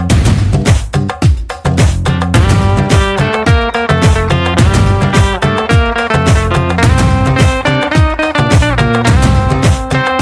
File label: Drum Beats Ringtone